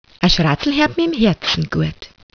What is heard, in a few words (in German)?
Herz